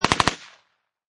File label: Machine Gun Uzi Model A Short Burst; Machine Guns